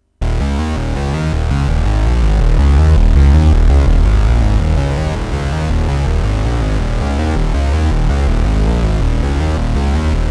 OB8 Unison

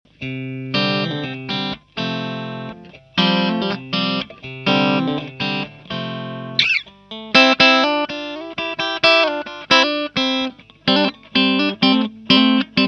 Clean

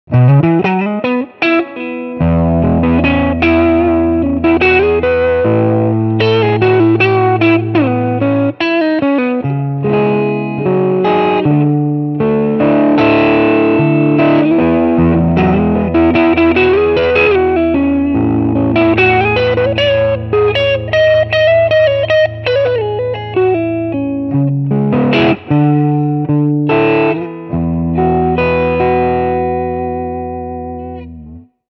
Channel Crunch Distortion

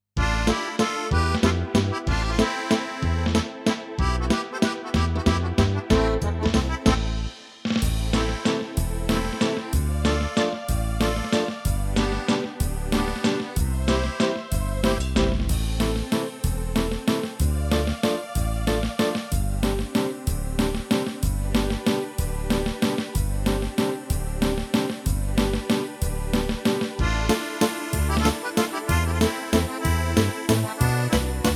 DP95 Style

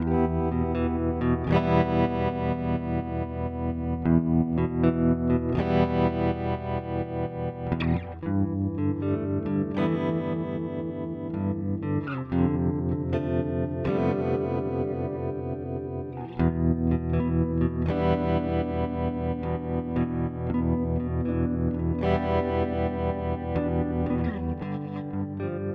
Tremolo; Astra Modulation Machine

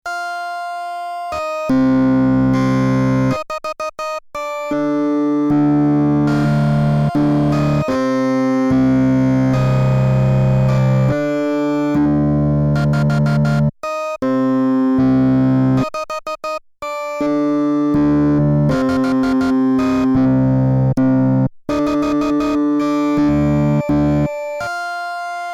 Reface YC Flaechle

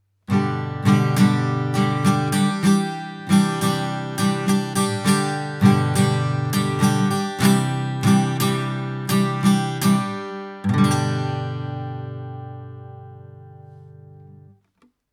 Se Electric DCM6 SM58s Guitar

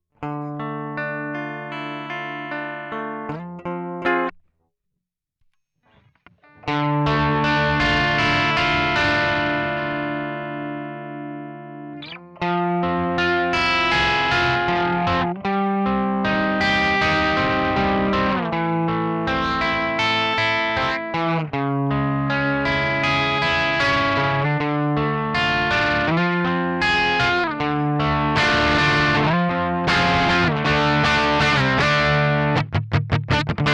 Vox Valvenergy Silk Drive Cab

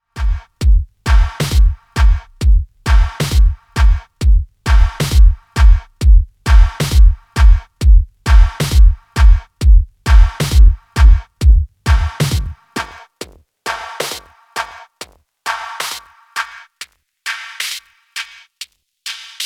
Master HPF mid. Resonance

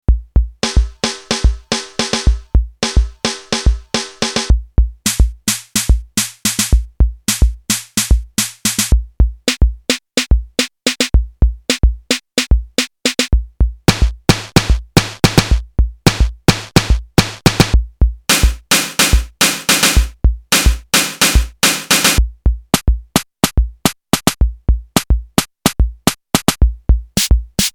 EMW Digi Drum some snares

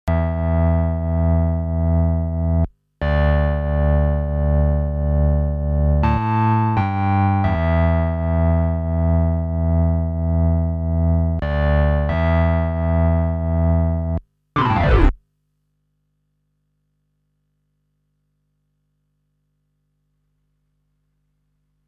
SE70 Rotary

